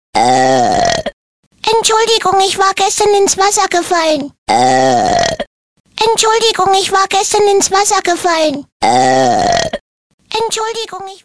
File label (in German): ruelps